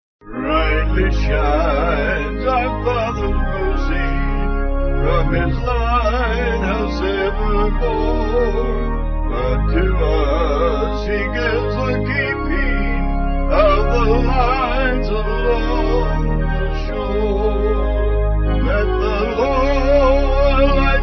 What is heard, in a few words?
vocals organ; Brightly Beams